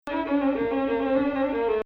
Hijaz

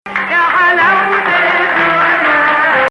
Ajam

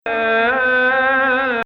Rast Kull illy habb Nahawand

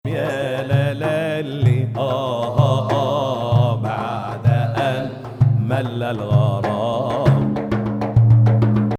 Ajam; Ajam, which resembles the Western Major scale, has two distinct versions

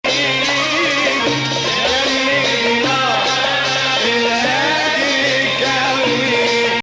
Bayati Nahawand

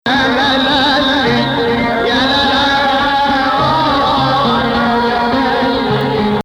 Rast Ya Shadi Nahawand

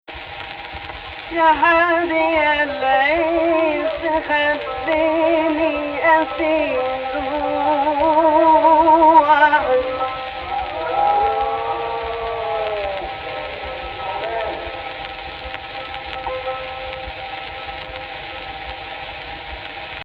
AHH, Egypt Rast

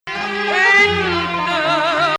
Ajam Nahawand

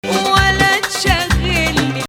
Hijazkar Rast

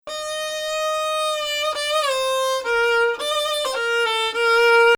Bayati Samai Ajam 6; Samai Bayati il-3ariyan

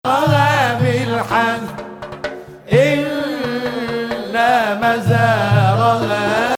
Hijaz Octave

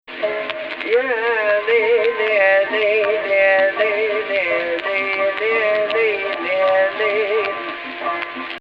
AHH, Egypt Rast

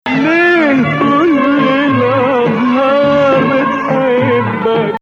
Nawa Athar Gameel Saba; An unusual combo with Saba, but this establishes Nawa Athar

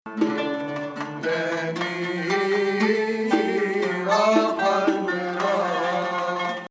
Hijazkar, Rast 4

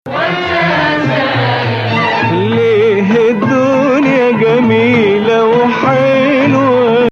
Nawa Bayati

